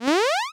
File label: door; Ulmos Adventure; pygame